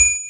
coin; limbus company

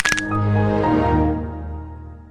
broken ankles sfx

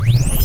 laser charge

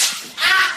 slap ahhhh

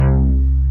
bass sound effect; roblox

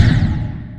death; geometry dash

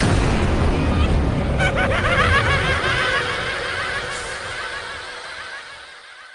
zombies evil laugh; cod zombies

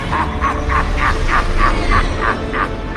laugh; green goblin

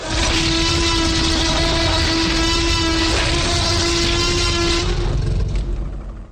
t rex roar

